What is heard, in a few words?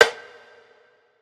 snare verbish, One Shots Snare, drum sample; SEISMIC Drum Sample Pack; Slate